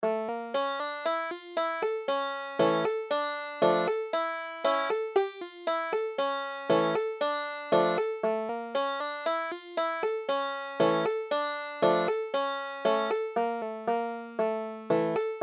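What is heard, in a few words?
tanz tanz yidelekh, banjo, Klezmer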